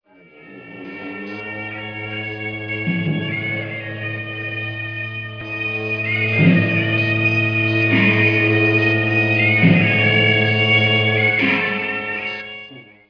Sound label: melody